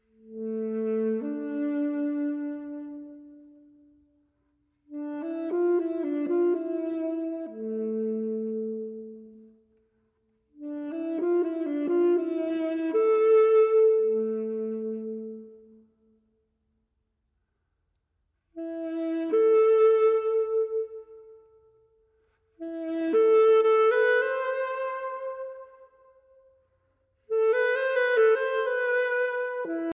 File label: pieces for sax